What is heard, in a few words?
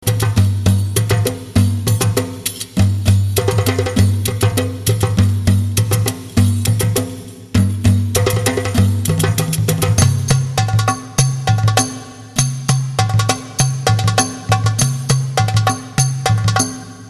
Rhythm Beledi